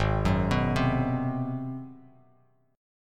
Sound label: G sharp 7th Sharp 9th, Bass Standard; arpeggiated; JGuitar